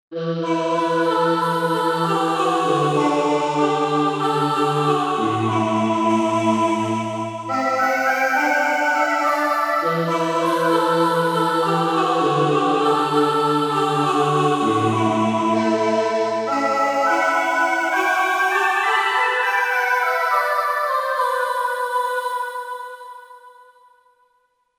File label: Voice Wind, Korg MSC-1S; Korg MSC-1S Variety